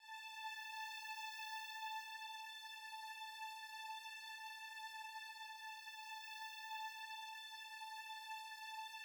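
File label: solo violin sustained d major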